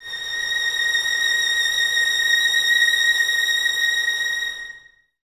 violins A#6, String Ensembles, 23 Violins; Miroslav Vitous

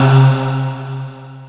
Q C-SY WAV L

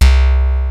HEAVY, ENSONIQ ESQ-1; Rubber Chicken Software Sample Bank

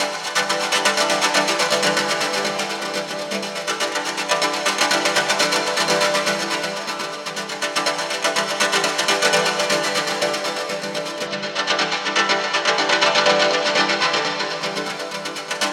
Hollow Sun, Modular Electronica Synths; Sonic Foundry (Sony Creative Software)

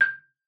MARM G6, MARIMBA; Xsample VOL-4

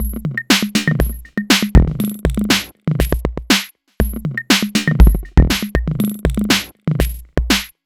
606 Jam, Drum Loop; Bent 606 Jam; Sonic Foundry (Sony Creative Software); The Electronic Music Manuscript Collection; Drum Loop Structures

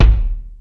Lotsa Kicks, Drum Machines E-MU Pro-Cussion

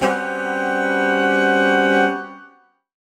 Horn Swell Alt Gmaj7b5; undercover samples

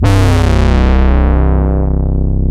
MATRIX 12, OB Synths

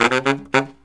BARYT, Killer Brass Riffs

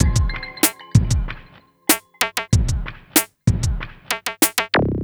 Smooth, R&B Drums

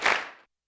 Hand Clap Large

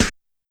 Snare Clap, YAMAHA RM1X

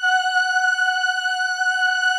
VP-330, String Machines

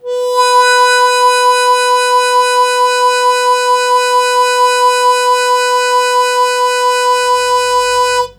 Long Sustain Fast Wah Wah B1, Harmonica; Zero G - Harmonica

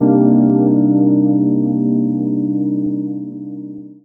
Chords Tones; Equipped Music; Loungin House

